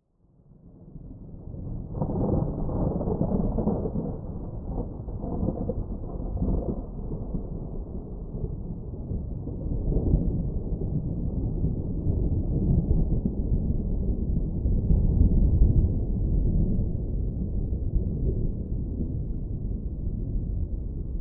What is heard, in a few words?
Thunder High Rising Thud; Thunders